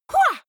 female fight shout attack short; vocals; comedy-cartoon; soundpool

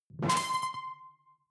sci-fi alien console high; maschinen; signale; soundpool

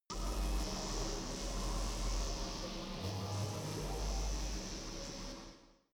space buzzing energy alien shuttle big; energie

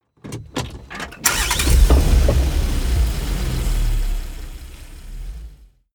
car car start

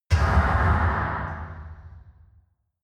metal large hard

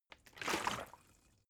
bottle water sparse upend soft; berufe

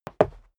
step dress boots concrete single stair down; menschen; schritte

